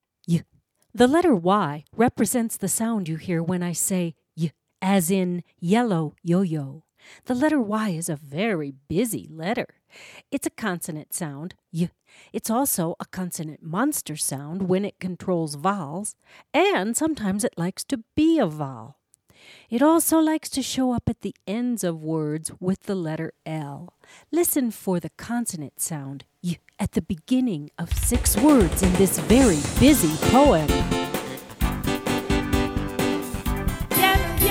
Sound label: Triangle